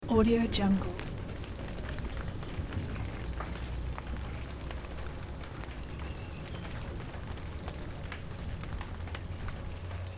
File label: rain subtle birds